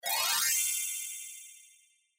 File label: pairing all matched